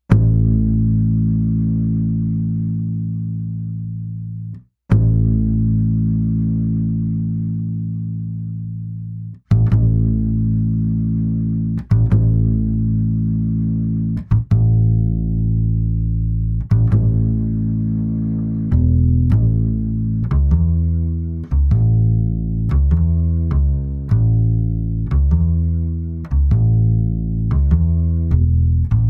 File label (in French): contrebasse